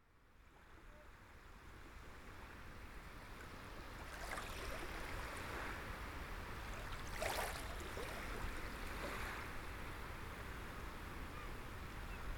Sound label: Waves On Crosby Beach At Ebb Tide Head Mounted Binaural Microphones